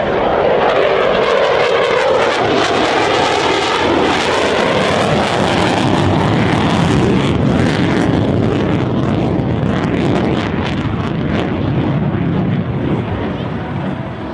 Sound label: jet